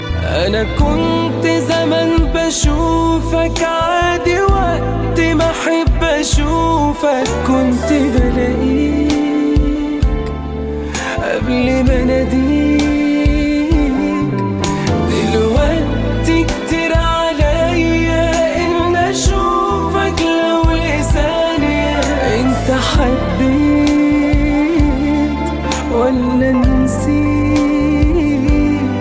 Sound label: Arabic Sad